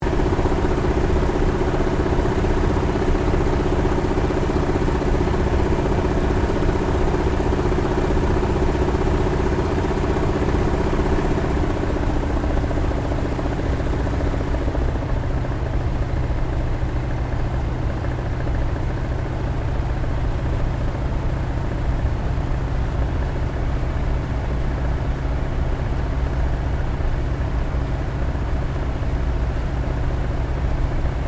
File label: AIRCRAFT CAC WIRRAWAY APPROACH INTERIOR; CA-16 Wirraway; Airplanes; Vehicles